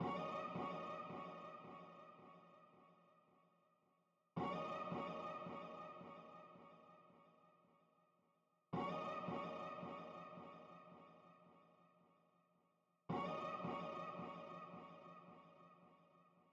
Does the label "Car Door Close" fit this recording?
No